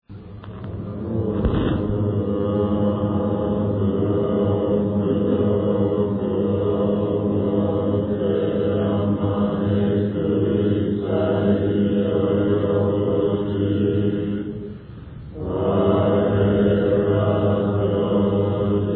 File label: end chant